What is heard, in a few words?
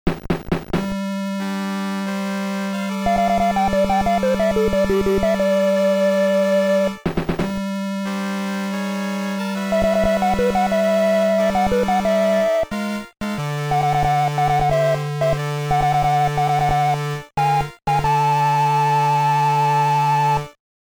Ceremony; just breed nes; Sapphire Tiara Ceremony